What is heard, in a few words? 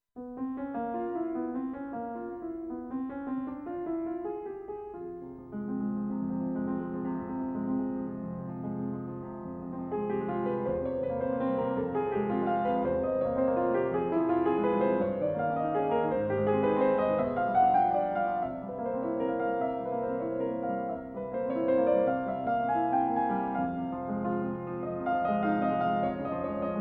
Etude for piano in F minor; Etude for piano No. 25; No. 1 of 'Trois nouvelles études'; B. 130-1; Chopin- Etudes (Complete)